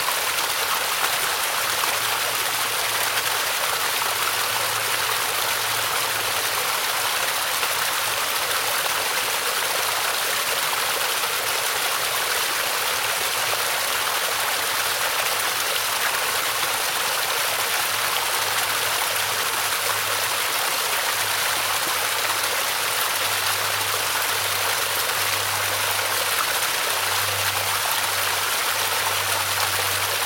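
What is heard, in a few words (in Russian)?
грохочущий холодный водопад в горах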